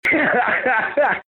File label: Big Beast Laugh